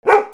DOGS

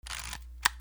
CAMERAS

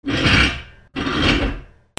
FRICTION

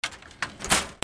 OFFICE

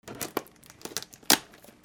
KITCHEN

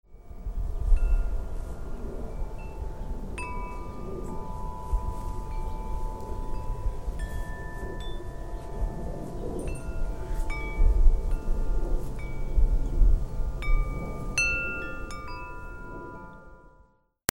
AMBIENCE

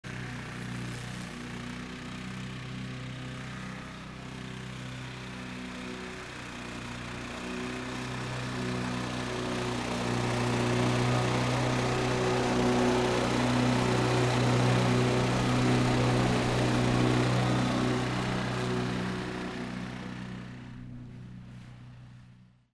VEHICLES

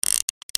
SCIFI

